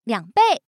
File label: Chip